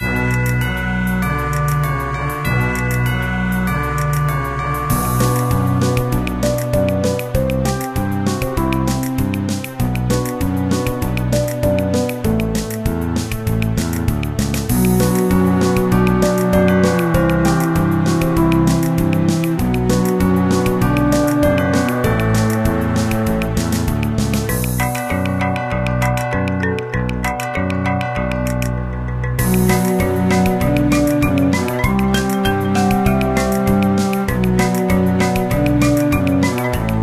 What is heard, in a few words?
Boss Music; Snowy the Bear's Adventures